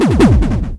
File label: FX HIT, Techno Trance; Ueberschall